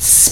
Sssss; inner demons

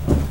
Walk; inner demons

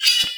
Sword Unsheathe; inner demons

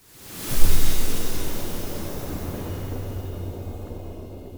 Plunge; inner demons

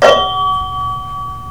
Metal Sound; inner demons

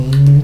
Mmmm; inner demons

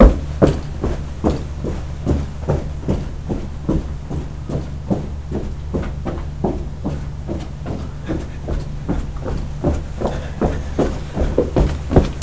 Walking; inner demons

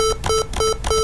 System Beeps; inner demons

